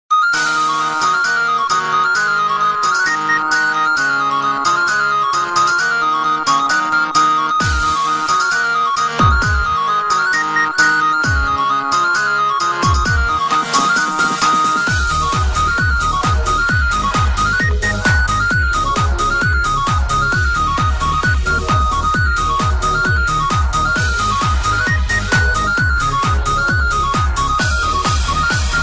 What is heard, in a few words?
Best Dance Tune